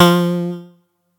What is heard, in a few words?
atari 520st F3